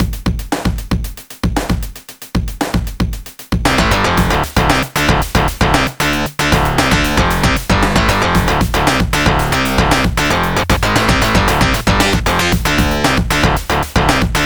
music character selection; Duck Game

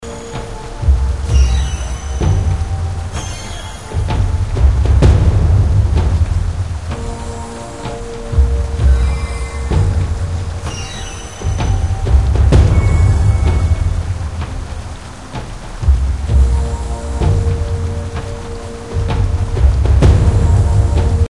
menu theme; temple run 2

